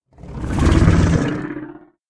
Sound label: Dodo Rex Look Around